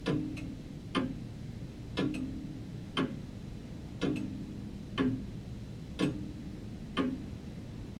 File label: Clock Grandfather; The 39 Steps